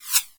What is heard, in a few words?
bullet pass; teardown; ps5 windows xbox series xs